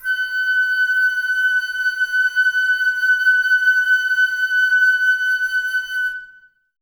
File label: Flute, Woodwind; Miroslav Vitous - Woodwind Brass Ensemble